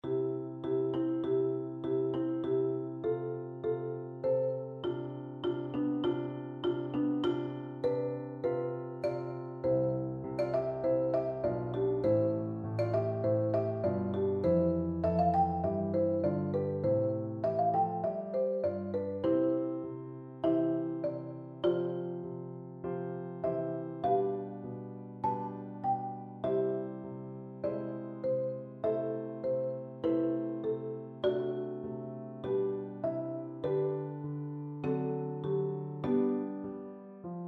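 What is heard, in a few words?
marimba, percussion; bach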